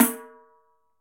Pandeiro Rim; Latin Percussion; Drums; Drum Hits; Tambourin; Ableton; Factory Packs

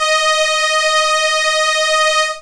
Solina Viola D#4; Analog Synths; Ableton Foundation FreePacks

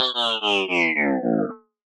Robot Downgrade, effects; Ableton; Glitch and Wash

